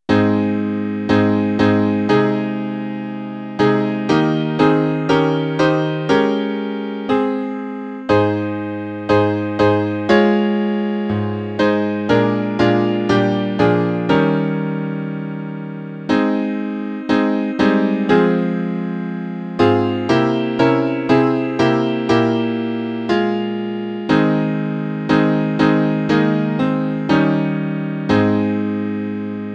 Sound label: piano; I Sought the Lord